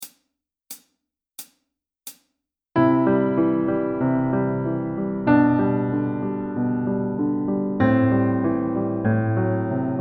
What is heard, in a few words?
modulating Prinner